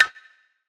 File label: drum hit clap